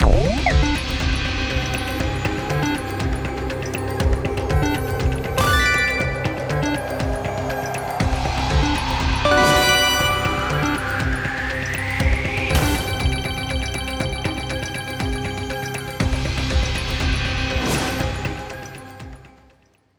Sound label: megawin horror; bigwin; Captain Shark; wazdan